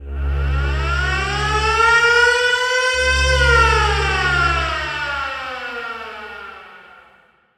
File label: Hockey Goal Pittsburg; Hockey Horns & Buzzers